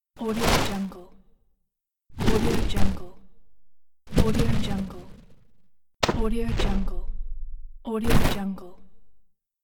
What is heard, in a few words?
percussive ingame